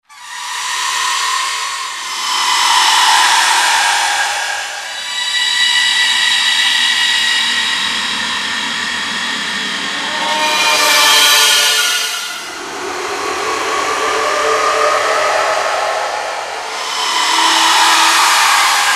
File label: halloween theme song remix